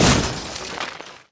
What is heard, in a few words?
WRECK, Vehicle